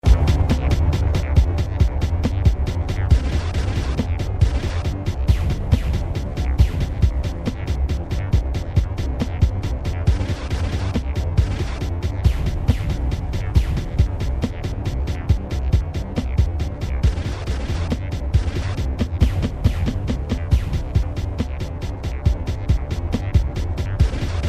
vintage future beat

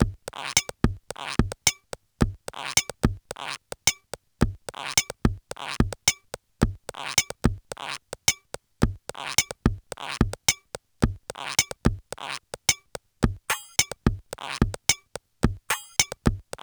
loop, 109bpm

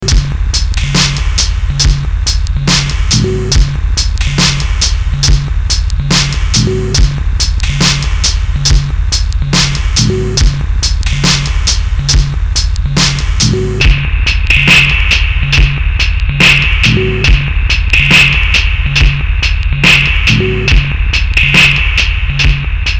mpc2000 bleepz beat

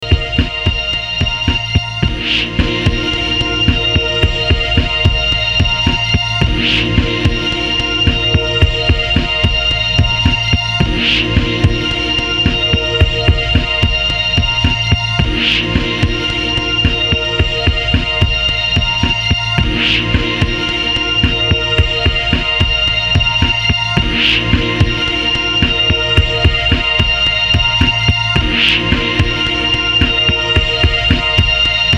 magic wand beat

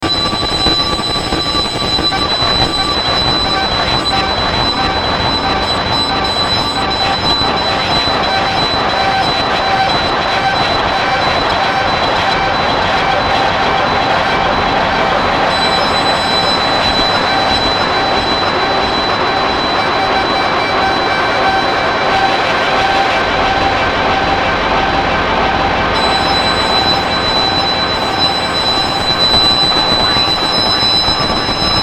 noise sp202 session

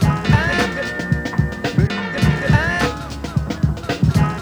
loop piano

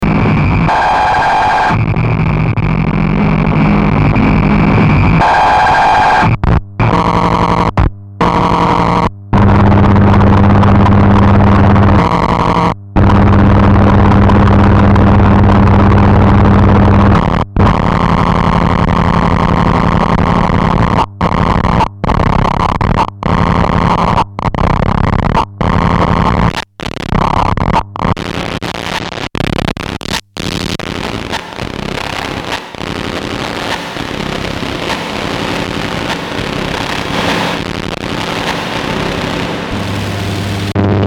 noise

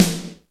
Snare, Akai MPC-60

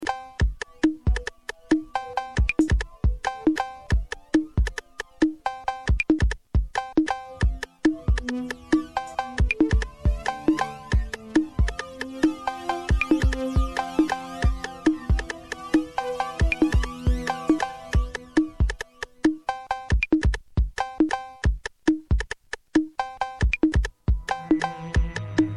microkorg electro beat

